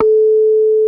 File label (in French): diapason